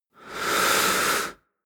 inhale male

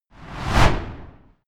torch flame whoosh

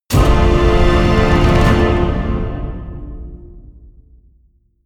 medieval jingle orchestral negative

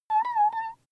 child play

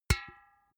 empty can hit

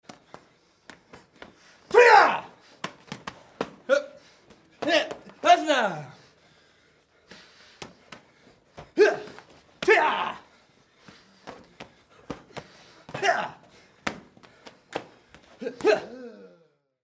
karate fight

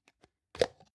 plastic can small lid open soft

